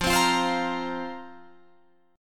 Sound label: F Suspended 4th F down Guitar Open F; Fsus4; strummed